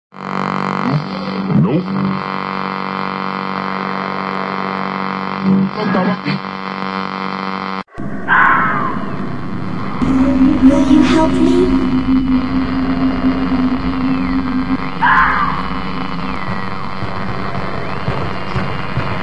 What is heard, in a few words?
scary tv